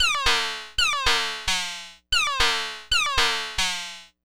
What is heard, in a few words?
lead B